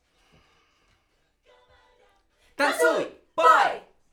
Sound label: Backs AKG